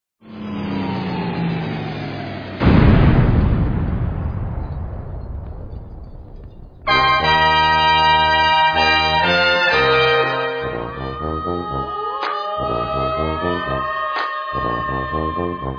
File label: Brass Band